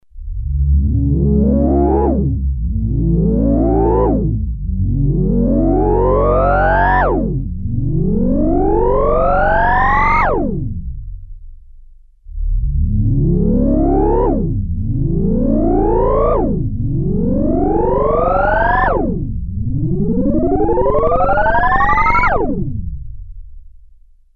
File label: lfo drives reso; subconscious communications model53; lp-lfo drives reso